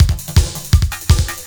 LOOP, Eurotech; Sounds Good

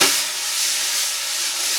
SN, EMU Orbit-3, drum machines; 300 Drum Machines

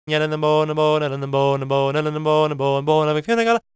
GAELIC, World Voices; ILIO; Vocal Planet

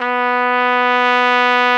B3, BRASS; AKAI S-Series CD-ROM Sound Library VOL-8

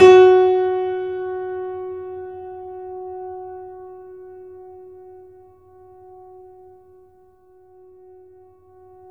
F#, piano sustain

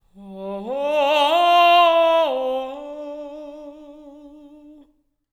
LYRICAL; Spectrasonics; Symphony of Voices

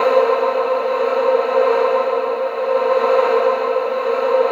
HUSKY CHOR; Distored Reality

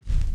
Foley

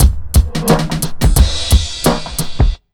88 BEAT, Underground Hip Hop; USB Soundscan vol.02; AKAI

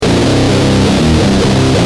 Guitar, Industrial

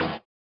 SWUSH; Spectrasonics; Metamorphosis

